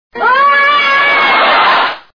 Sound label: wah; I Love Lucy